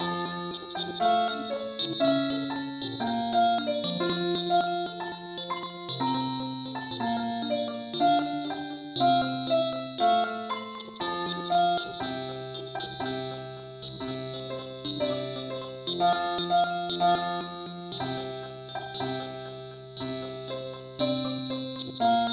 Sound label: mandolin key f